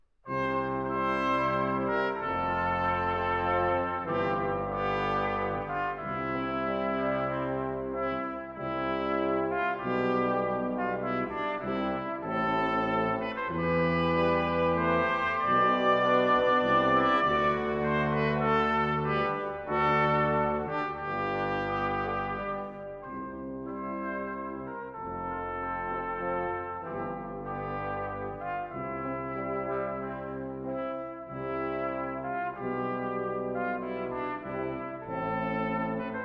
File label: Brass, Sarabande; Suite Francaise 3.